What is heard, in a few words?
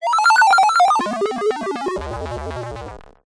science fiction robot chirps vocal beeps shut down